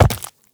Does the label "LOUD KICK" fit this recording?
No